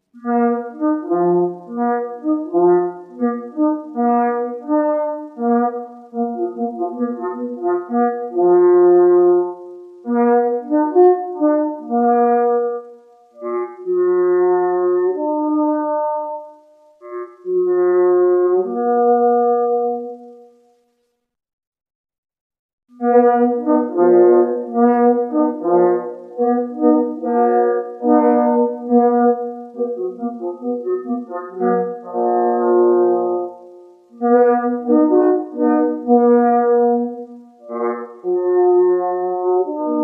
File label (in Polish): wilk lub rys na rozkladzie